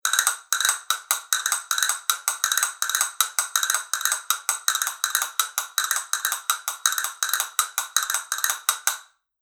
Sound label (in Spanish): castañuelas vidrio